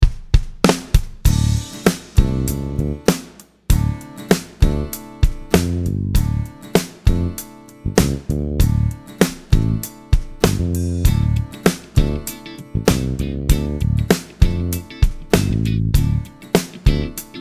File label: Backbeat 98; Foundation